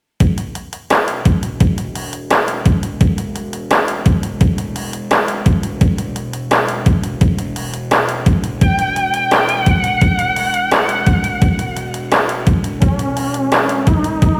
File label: OB 8 Drumtraks